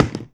BOOT STOMP

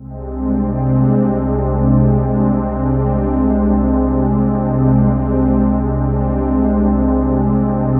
composite, ethereal atmosphere; USB Soundscan vol.13; AKAI

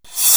HH SR16; 90 s Sample CDs; Best Service Dance Mega Drums

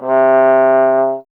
Trombone C; Miroslav Vitous - Solo Instruments VOL-1

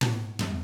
Double Platinum Drums, COUNTY; ILIO; Double Platinum Drums 1

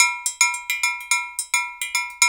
Island Bash

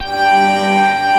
G4 ACID; NorthStar - The Wizard VOL-2; RAINMAN

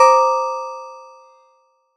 SY LUSHLY; ModelCycles

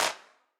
Wider Clap

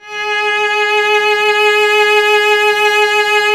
STR VC. G#, SOLO SECTION; Roland - LA Composer vol. 1

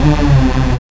SYN RON, Dance Synth; Sound & Vision - Gigapack I CD 2 (Roland)

